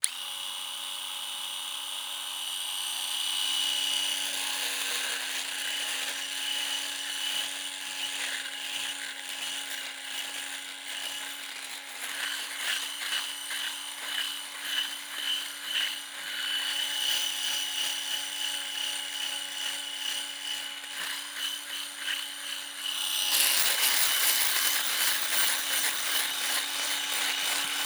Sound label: Shaving, Electric, Different Positions, Buzz, Close; Soundreorganized; Everyday Actions 1